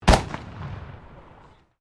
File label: MUSKET CAPLOCK 45 CAL HEAVY CARTRIDGE FIRING, at a shooting range; Black Powder firearms at a shooting range; Gun Shots